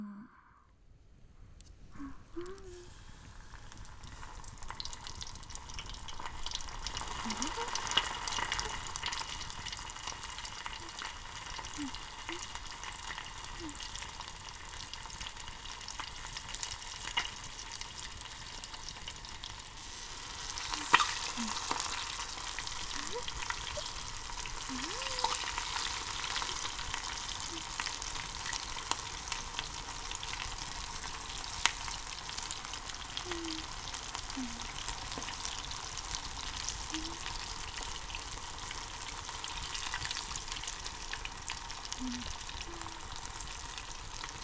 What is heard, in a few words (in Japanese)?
料理する＆焚き火の音